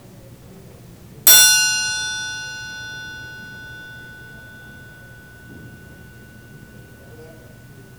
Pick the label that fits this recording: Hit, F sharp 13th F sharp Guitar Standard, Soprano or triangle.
triangle